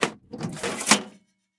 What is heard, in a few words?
case Remove Metal